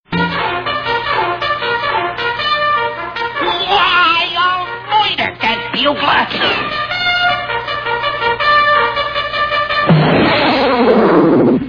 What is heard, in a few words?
Bugle Morning Wakeup